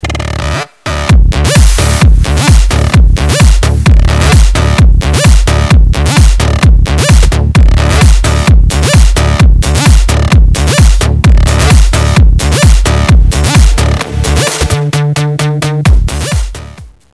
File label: Club